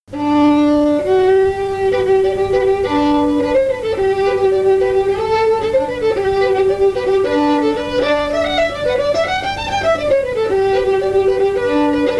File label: fiddle; SeamusGrantFestival; tune tutor